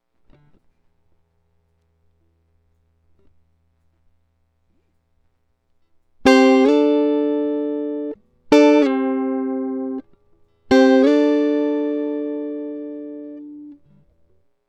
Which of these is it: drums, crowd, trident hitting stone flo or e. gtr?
e. gtr